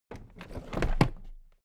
toolbox raise heavy

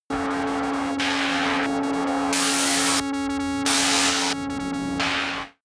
scatter vent synth

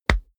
combat chest hit

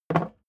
hammer wood put down

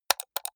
computer mouse left click double hard

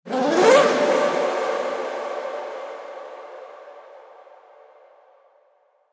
f1 engine acceleration

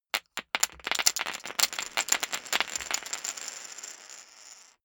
poker chips drop

